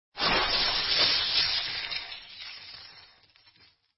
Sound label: coins